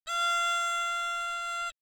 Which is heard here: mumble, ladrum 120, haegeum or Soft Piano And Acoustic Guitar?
haegeum